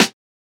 nice acoustic snare sound a key, Royality free acoustic snare sample tuned to the A note.